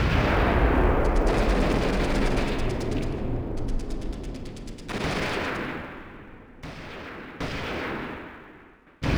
battle; Soldier Of Fortune II Double Helix; XBOX; ambience; jordan

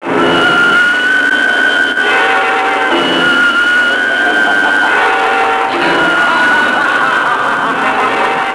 ufo; Splashdown; XBOX; Soultree; Bering

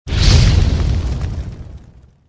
fire burst

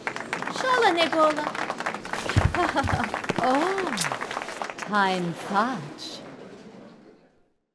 dance outro fair; Sid Meiers Pirates; XBOX

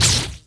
ls block, weapons

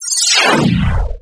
teleport arrive; Star Wars Clone Wars; XBOX; Xbox Live Effects

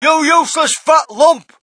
crowd, shout; Mike Tyson Heavyweight Boxing

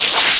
frog

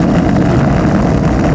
roll dirt packed; terrains; Tony Hawk's Project 8; XBOX

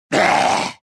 anger; reborn

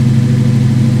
Mustang67 rpm, Engine; Cars; XBOX

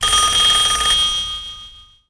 telephone; BedRoom

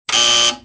buzz; Soldier Of Fortune II Double Helix; XBOX

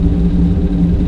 energy, ambience; cairn

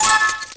Pickup Key; Finding Nemo; XBOX

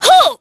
damage; Pulse Racer

